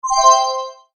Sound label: multimedia tone alert